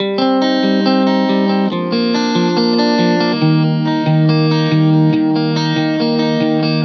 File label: Guitar 140 BPM Cmin; Guitar Loops; Ghosthack; Ghosthack Advent Calendar 2021; Post Up